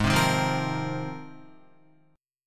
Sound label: G sharp 6th Add 9th, Guitar; strummed; JGuitar